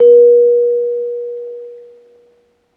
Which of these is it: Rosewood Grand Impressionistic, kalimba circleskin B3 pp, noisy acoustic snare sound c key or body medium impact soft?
kalimba circleskin B3 pp